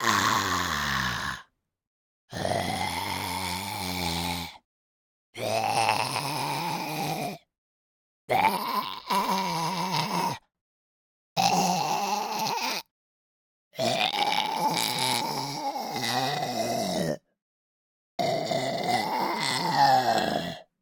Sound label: zombie vocalizations general; fvtt sonniss gdc audio